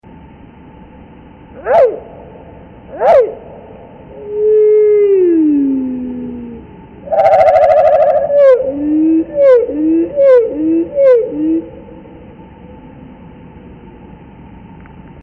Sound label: Eastern Towhee, Parabola